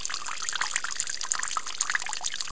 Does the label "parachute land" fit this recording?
No